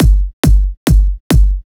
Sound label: Kick 138BPM; Drums & Perc; G#minor